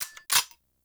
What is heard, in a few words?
Double Barrel Shotgun Mech; Warframe; Weapons; Tenno